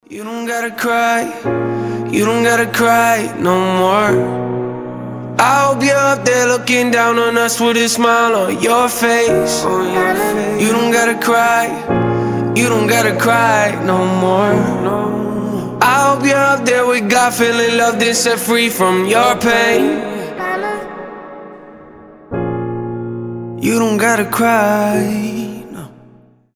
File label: rap